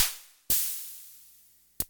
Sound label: filter, drumstar